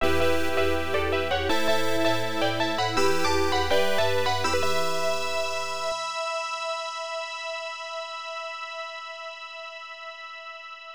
Fanfare, PSG OPM; Gilgamesh Sorcerian; Sharp X1 turbo

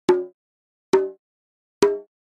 Countdown; SFX - Survival

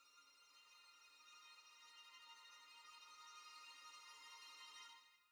Intro Pad L; SmallDungeon

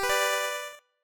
hero chord

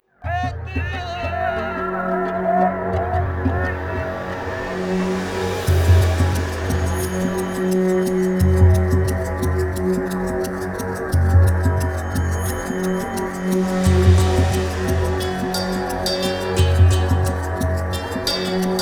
Catacombs Ambient; Prince of Persia Warrior Within

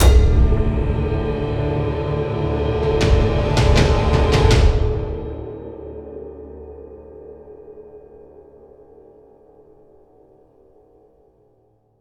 Mission Game Over; Cross of the Dutchman

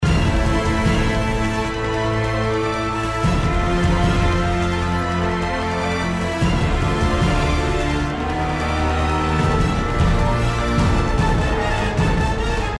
Dragon Loop; Infinity Blade; android

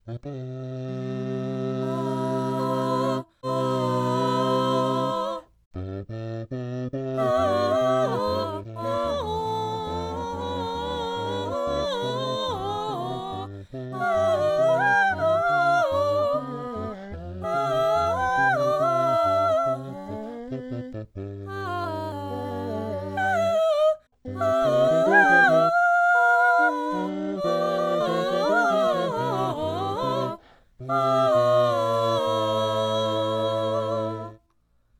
Full Choir; Later Alligator; Lator Gator

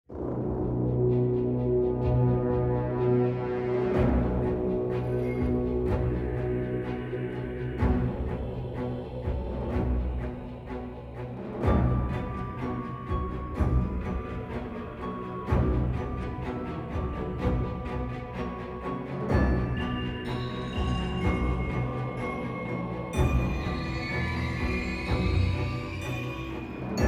Astral Plane Ambient Music; Baldur's Gate 3; unofficial game O.S.T; Xbox Series X|S

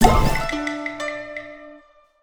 End Level; Taqoban; PS4 PS5 Switch Windows Xbox One Xbox Series X/S gamerip 2022

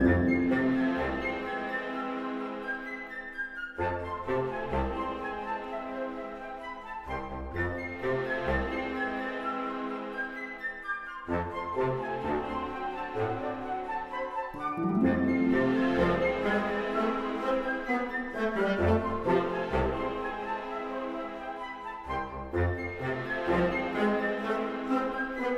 In love 64bpm Loop; Music Dialogue

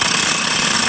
Meca Gear Heavy LOOP Verb

